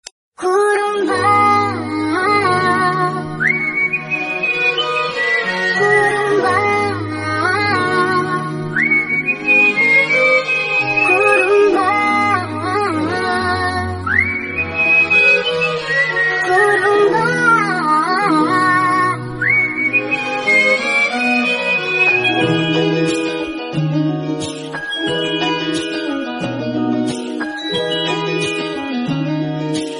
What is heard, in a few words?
tamil song